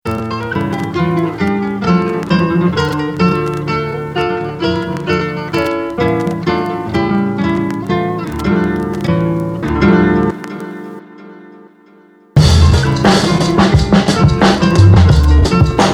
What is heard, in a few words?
instrumentals